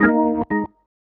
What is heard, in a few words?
organ D#, vocal house; PS-28 Vocal House Samples; organs